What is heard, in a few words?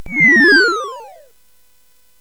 SFX; Narco Police; hvsc